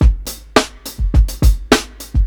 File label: 105 OFF BEAT, DRUMS; LOOPS; BASEMENT DRUMS VOL 2